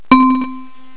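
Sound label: XYLOPHONE NOTE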